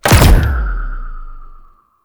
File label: Windmill Power On; Warframe; Duviri Murmur